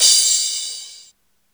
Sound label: ride d sharp key